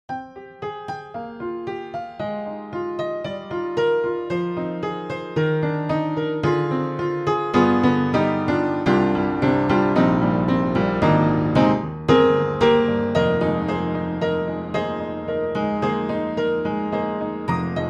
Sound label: Upright Pianos Vintage Upright Dynamic Vintage Upright II; Synthogy; Ivory II